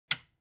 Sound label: metal button release power off